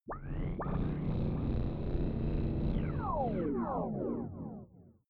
sci-fi night fall small planet, drones

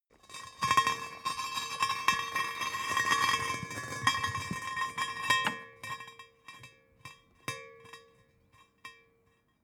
small metal rolling

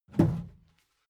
wood board handle roomy; objekte; holz

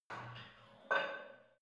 barbell light plate put on hit reverby soft; sport freizeit; fitnessstudio

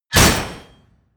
slam, tueren

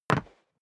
step boots single wood parquet single stair up; Footsteps; schritte

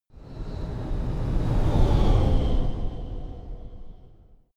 sci-fi vehicle train passing; raumschiff

